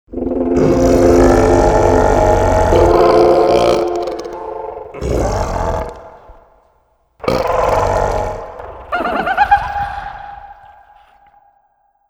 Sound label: moon burping then laughing